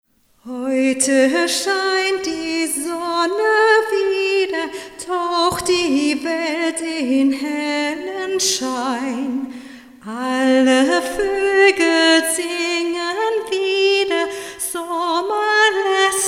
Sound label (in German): Vocals Reverb Hall 40 Prozent Wet; Renaissance Reverb Hall1